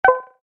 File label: join